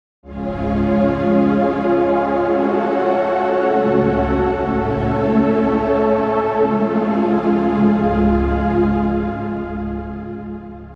Strings

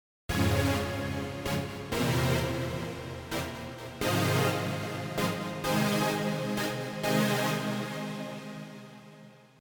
Synth

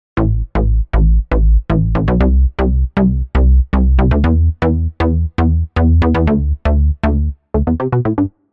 Synth Bass

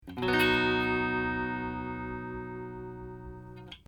b chord

